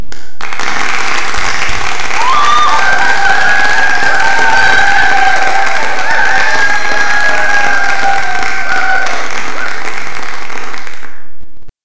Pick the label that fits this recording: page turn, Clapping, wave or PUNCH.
Clapping